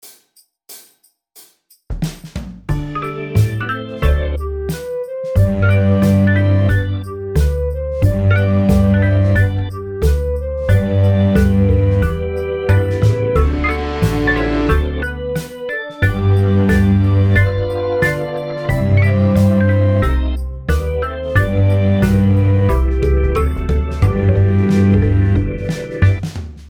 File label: When The Saints Go Marching In cello